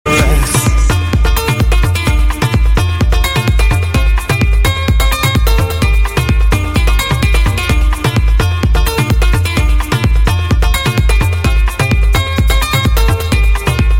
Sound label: arabic night ringtone